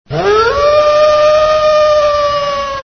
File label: Wolfman